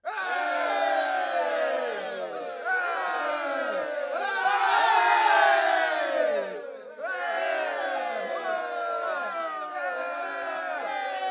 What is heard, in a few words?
Human Crowd Bull Fight